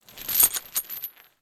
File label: Metal Heavy Chain Lifting; InspectorJ; Thats Metal